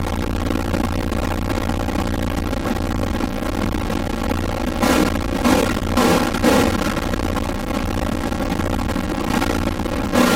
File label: production element distortion hum; Distortion Glitch; PremiumBeat